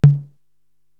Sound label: TOM Distorted, Korg TR-Rack